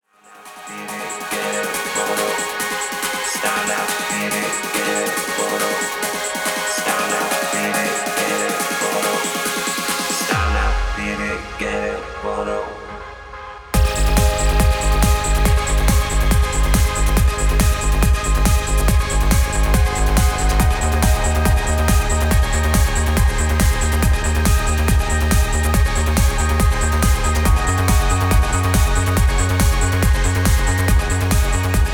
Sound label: Classic OLD SCHOOL TRANCE